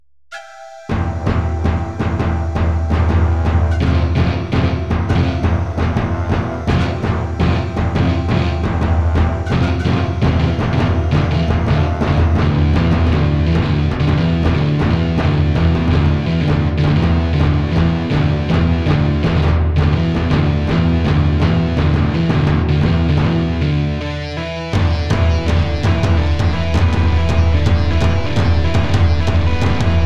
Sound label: Drum Dance, street drum band dance with overdriven electric guitar